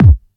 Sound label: studio rap kick e key